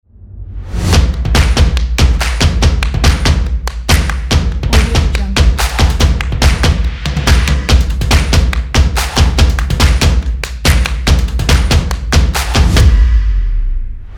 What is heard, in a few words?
drums energetic rhythm